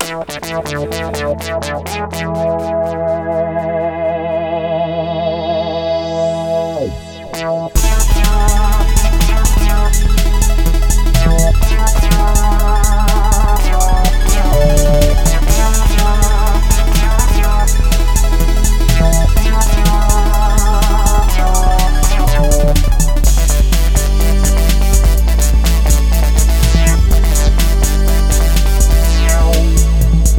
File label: MicroKORG; lolicore